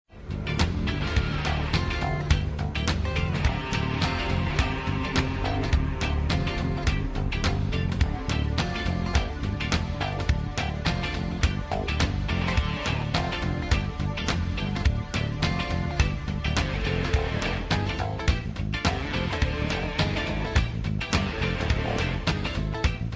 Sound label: pop rock